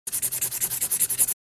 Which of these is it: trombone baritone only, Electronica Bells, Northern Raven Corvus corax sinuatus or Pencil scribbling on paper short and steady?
Pencil scribbling on paper short and steady